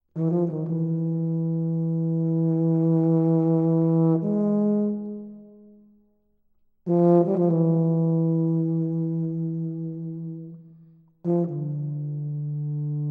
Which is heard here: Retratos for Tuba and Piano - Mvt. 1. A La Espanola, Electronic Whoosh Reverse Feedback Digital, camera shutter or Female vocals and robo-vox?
Retratos for Tuba and Piano - Mvt. 1. A La Espanola